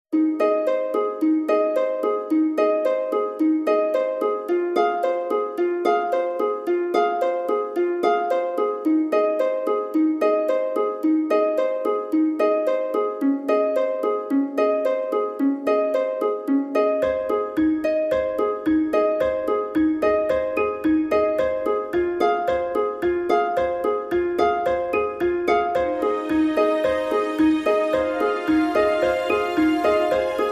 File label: No Solo Violin Mix